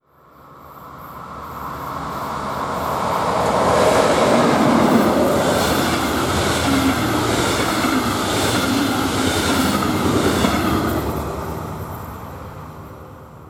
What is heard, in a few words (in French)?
trains diesel et electriques; sonotheque